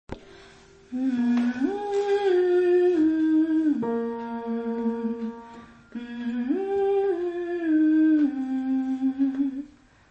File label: Jingle Bells, choir